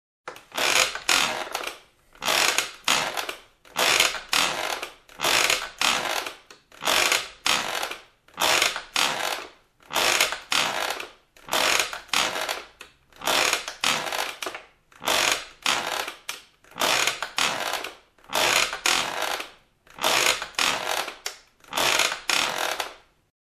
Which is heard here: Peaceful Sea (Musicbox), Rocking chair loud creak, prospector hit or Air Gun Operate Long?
Rocking chair loud creak